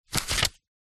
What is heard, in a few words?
household book very small page turn; zapsplat